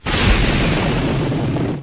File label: earth hit; DAoC; Toa; Gamestar